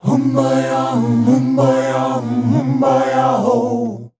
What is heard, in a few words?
"humbaja", shouts; Best Service; Hyper Dance